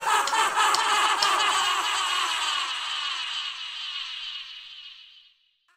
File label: All Aboard Laugh; netent